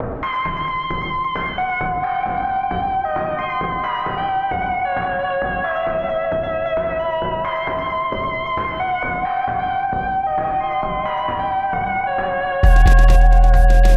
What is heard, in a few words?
gunky